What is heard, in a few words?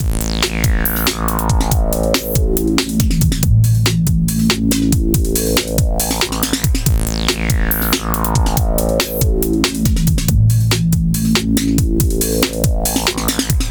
bg music; wyrian